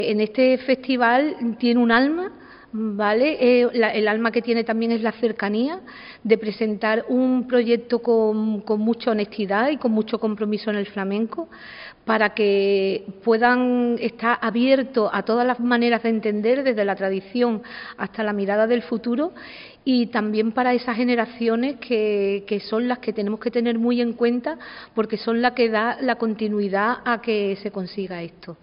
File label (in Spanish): Flamenco; Vejer Flamenco